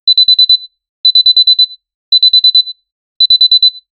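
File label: emergency alarm two beeps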